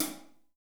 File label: HH 1L R, DRUMKIT